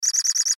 Sci Fi Computer Screen Readout Digital Display Loop